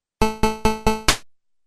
MSX; Ma Shougi

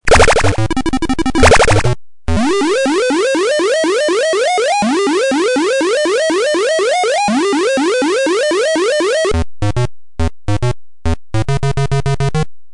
Arcade; Sheriff

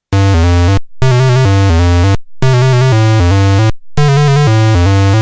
X1; IceClimber

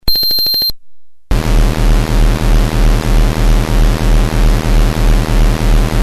X1

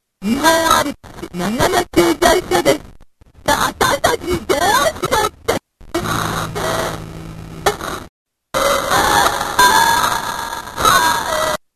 PC-8801; Star Trap

